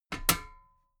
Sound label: metal ladder step regular clank